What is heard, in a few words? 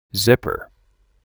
zipper